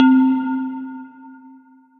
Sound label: bell; Drakebell; Ableton; Beat Tools; Bells & Mallets